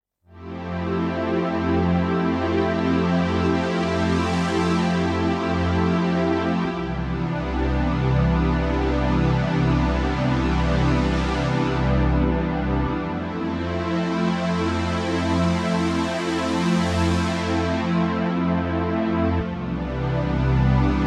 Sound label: Iridium Core Wheel Strings